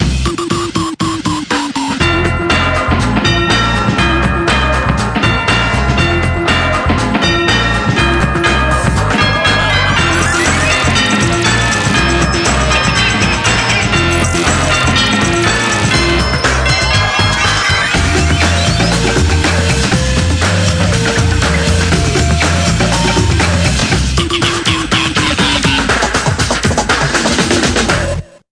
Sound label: Level Complete; Futurama; PS2